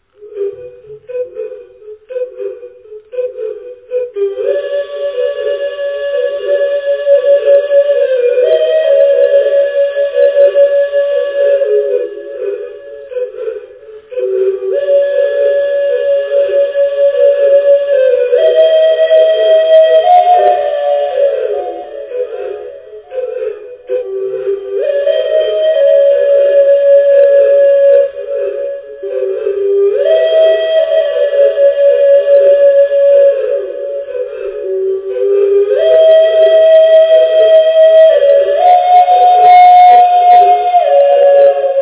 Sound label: Okarina